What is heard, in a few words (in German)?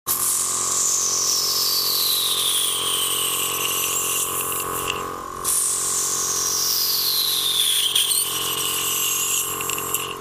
Absauger